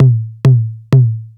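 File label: lo tom, drive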